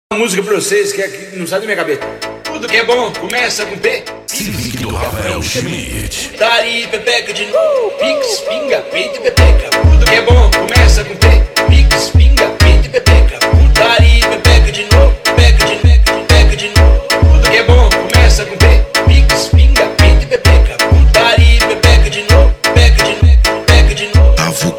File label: funk mega bass